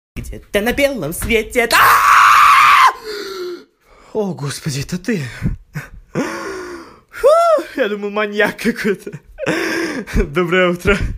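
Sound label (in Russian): сильный испуг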